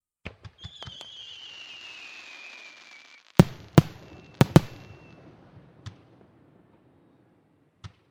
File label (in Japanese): 花火の音; firework